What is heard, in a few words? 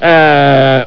ahhhh